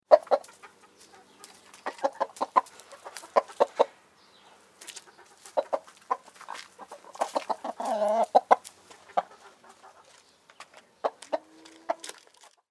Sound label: Rooster Clucking And Walking; chicken-sounds; fesliyanstudios